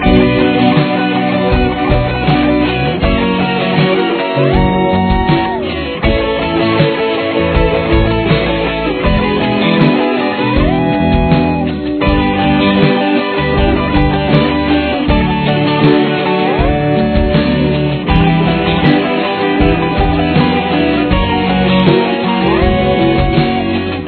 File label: dual